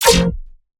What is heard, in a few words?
Oneshot Fmin; radiance; Purpose